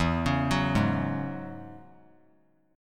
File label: E 6th Add 9th E down; E6add9 arpeggiated; JGuitar